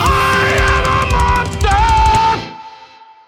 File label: bonus guitar; monsterplex